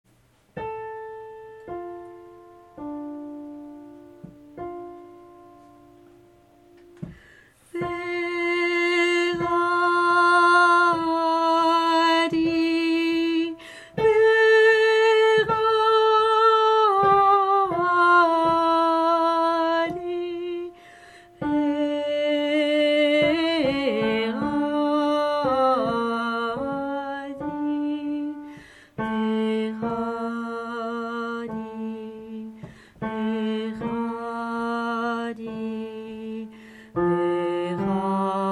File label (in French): alto; Vehadi; Peter Deunov; SATB; concert 2009; Partitions et sons du concert du 15 août 2009